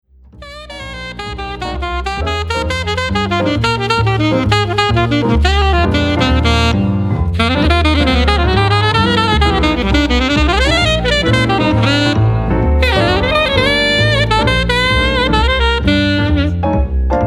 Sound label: Alto Swing